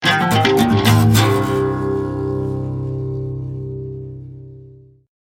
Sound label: Death Guitar